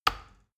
plastic button tiny push heavy

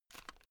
headphone extend single short

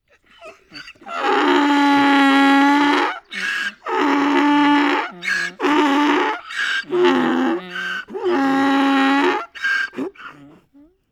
donkey cry

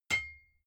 hammer hit

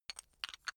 chess pieces rattle

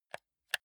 console controller r1 press slow

